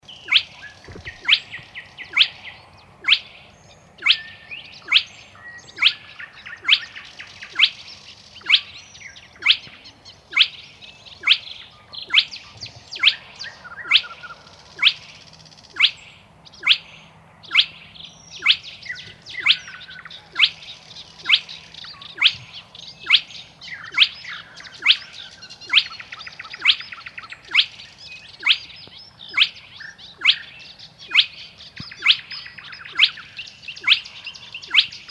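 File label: pogonysh golos, spotted crake voice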